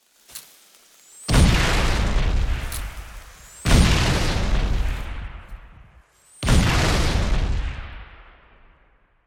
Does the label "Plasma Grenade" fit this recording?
Yes